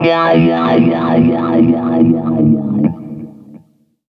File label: Building Hover